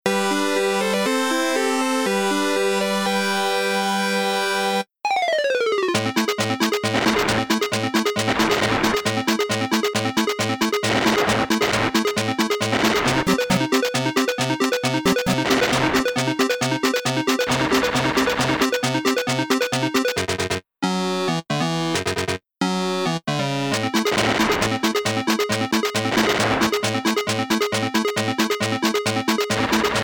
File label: off melody